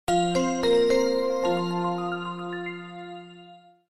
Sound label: feeling Off; Alcatel OT-903; switch on off